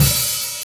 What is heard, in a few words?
OHAT; Funky Ass Loops; East West